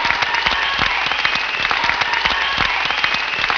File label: crowd part; Stunt GP